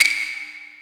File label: drum hitclap; AL Ayanami by [Winter]